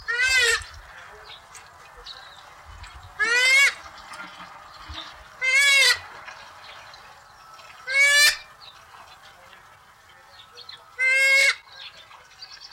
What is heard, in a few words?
Pukeko